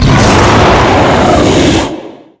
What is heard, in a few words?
shield roar